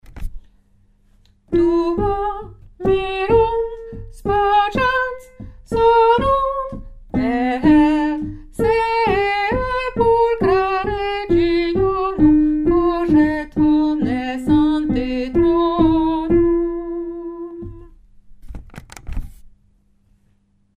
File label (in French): mesure 93 alto, chorale